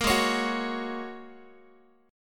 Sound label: G sharp 7th Suspended 2nd Suspended 4th down Guitar; G#7sus2sus4 strummed; JGuitar